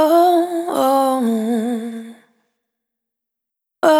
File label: Spin, Single Voice Melodic Loops